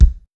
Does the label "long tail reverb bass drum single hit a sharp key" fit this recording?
Yes